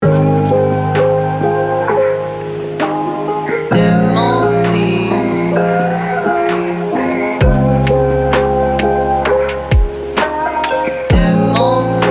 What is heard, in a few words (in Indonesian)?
lofi, hum yang lembut, berpadu piano melankolis dan drum